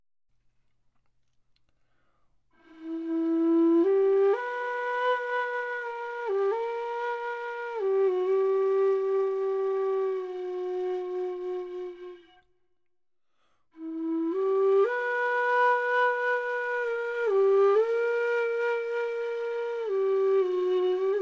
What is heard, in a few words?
SCALES